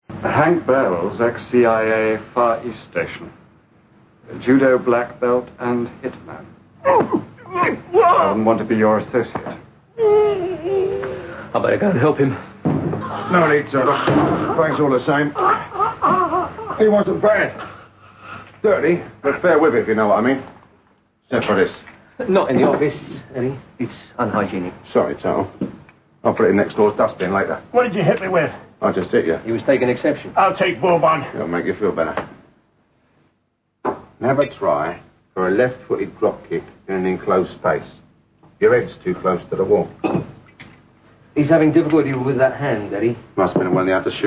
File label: office scuffle